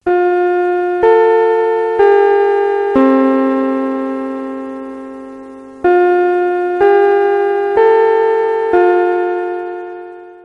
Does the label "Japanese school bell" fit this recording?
Yes